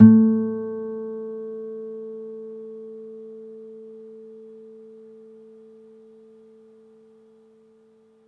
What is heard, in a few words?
Harm, Classical Guitar